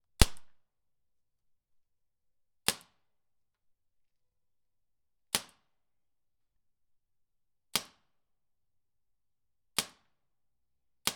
Paintball Gun Fire

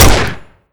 Single Gunshot

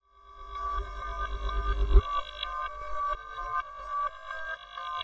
Telephone

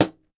Book Slamming Shut

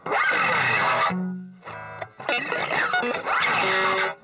Electric Guitar Slide

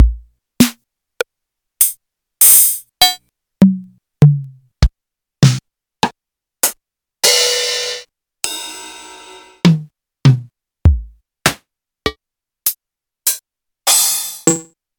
Classic Drum Machines; Reel For Morphagene

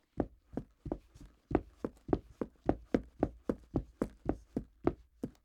Fast Foolsteps Hardwood

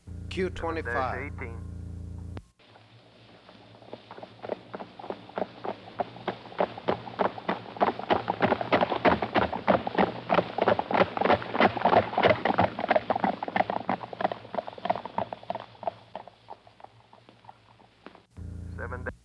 Horse On Cobblestone

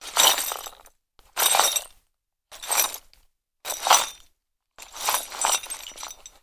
Small Metal Pieces Shakes

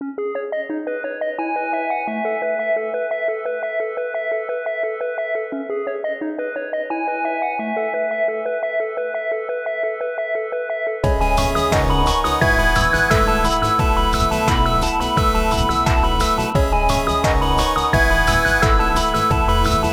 Video Game Menu Music

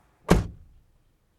Closing Car Door

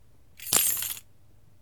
Keys Drop

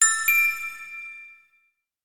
Star Twinkle Ding

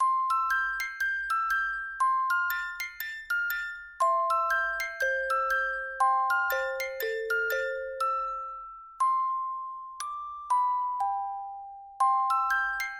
Happy Again

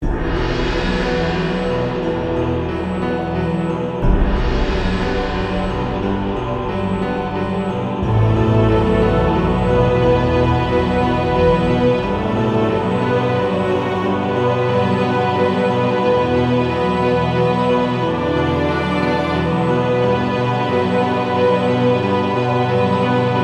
Epic Powerful Theme

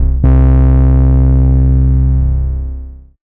Acid Bass; Spankmyfilth

